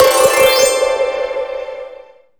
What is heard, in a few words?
LIFE RECOVER; maimai